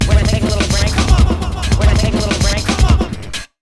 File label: RUN 140, Big Beat Drumloops